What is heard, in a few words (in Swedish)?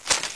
skog; svencoop; skogsljud